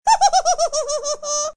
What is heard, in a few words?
Silly High Pitched Laugh